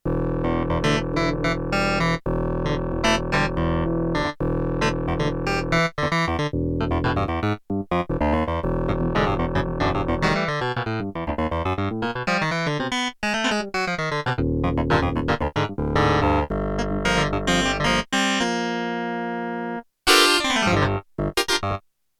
Korg DW-8000 FLANGING CLAV, Factory Patches

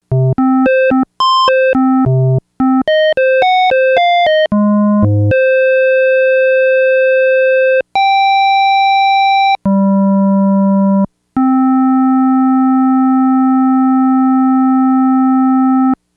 FM SQUARE, Yamaha DX100; Factory Patches

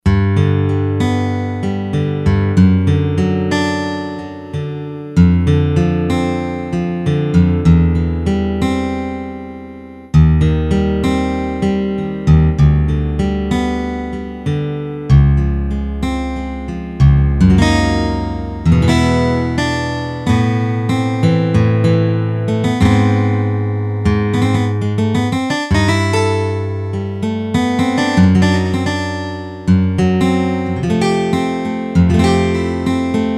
Acoustic Gtr, E-mu Proteus-1; Presets

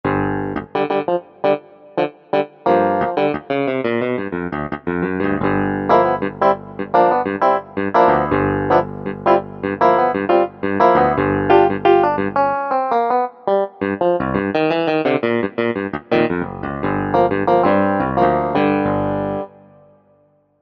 CLAVINET; Universal Sound Bank; Soundscan 09 Old School Keyboards

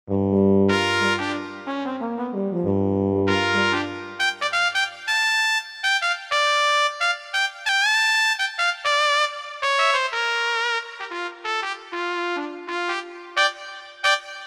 Sprit Brass; Korg MSC-1S Variety

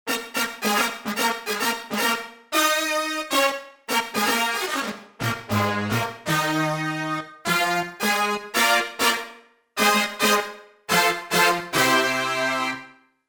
Brass Sect, Roland JV-880; Factory Patches

